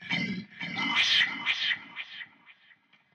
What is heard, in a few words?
Demonic Vocal